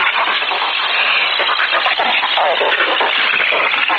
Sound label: computer crazy